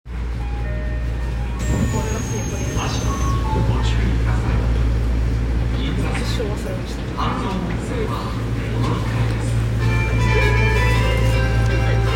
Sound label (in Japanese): 千代田線表参道東, train sounds